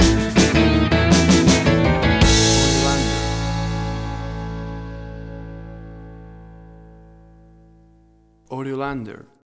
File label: Collegetown Blues Stinger